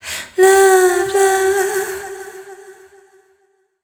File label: f mel g, fem Vocals